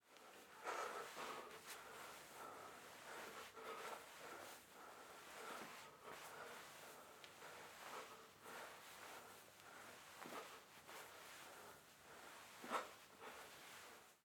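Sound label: Exercise Sit Ups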